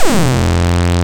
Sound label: SAW SYNC, Analog Odyssey